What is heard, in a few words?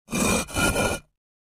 Cinder Block Scraped